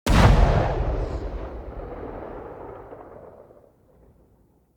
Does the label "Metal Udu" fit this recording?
No